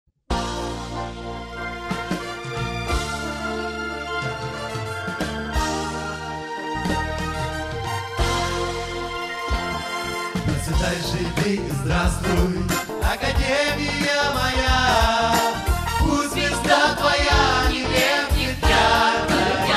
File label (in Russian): Гимн Академии РУССКИЙ